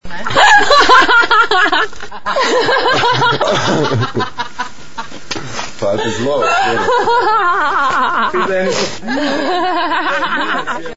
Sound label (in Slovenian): sanjski smeh